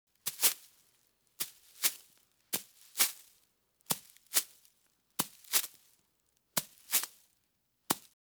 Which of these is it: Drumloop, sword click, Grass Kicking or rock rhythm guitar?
Grass Kicking